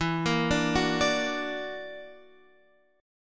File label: Gb Augmented 7th E